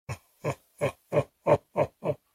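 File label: ha ha ha old woman slowly laughs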